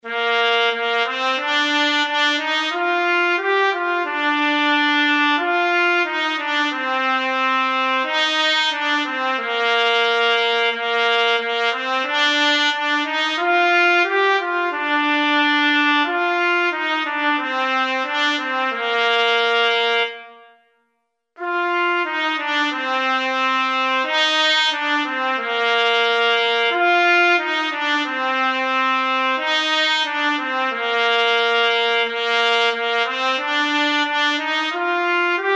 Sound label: long long ago trumpet